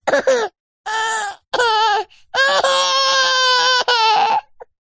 human child 15 months boy laugh tickled strained frustrated